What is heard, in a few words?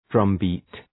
drumbeat